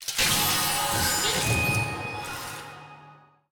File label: wheel Stop Click; codex of fortune